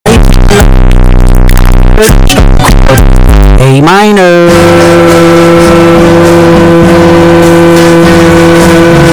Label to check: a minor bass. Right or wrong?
Right